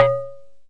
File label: TomToms G3, Yamaha DX100; DRUM MACHINES